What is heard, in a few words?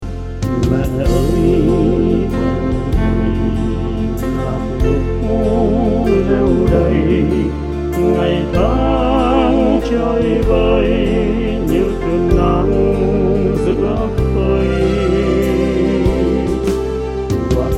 bass